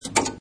Switch TV, Servo Panel Sound; Multimedia; Servo Panel Sound - Switch TV 5; Buttons; Lynne Music

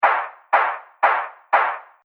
120 Bpm Loop Mutant Kick Machine; Percussive And Rhythmic; Seamless loop; Drum Loops; Andrew Fleming

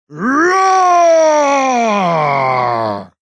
Yell Roar, Male yell, throaty, cartoon, roar; Yelling; VoiceBox

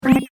Cool Laser Pulse Beep

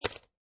Poker Chips Dropping On Poker Chips; Poker, gambling, chip: Poker chips dropping on poker chips, clicking; Lynne Music

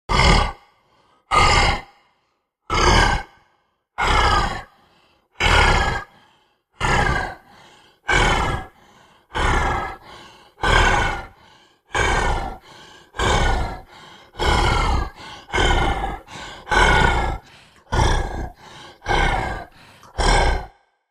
Panting Beast, Heavy panting beast sound.; Monsters; Horror

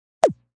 Lazer Sound; Laser Sound

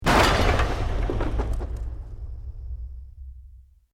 EXPLOSION MEDIUM WOOD

